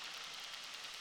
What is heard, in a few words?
Background Noise Clips